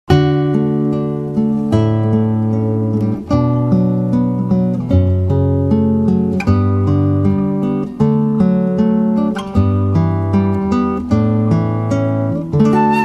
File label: Flute & Guitar